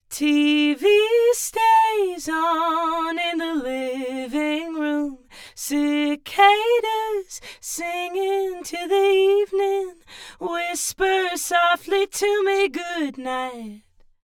vocal dual mono 10ms delay on right, Right side delayed by 10ms; Haas effect